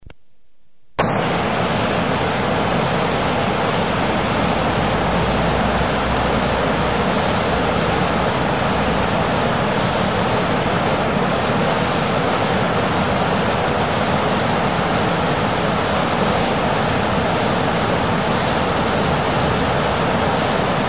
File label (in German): wasserfall